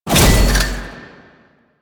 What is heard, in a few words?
Void Dragon Grapple Connect; Enemies; Warframe; Duviri